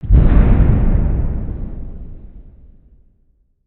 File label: Monastery Thud; ninjago